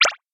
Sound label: Beeps